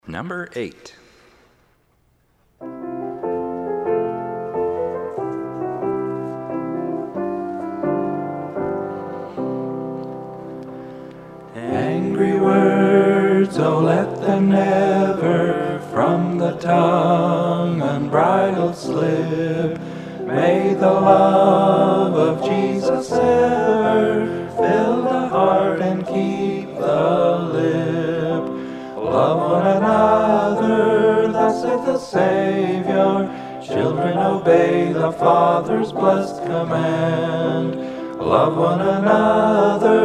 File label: Hymn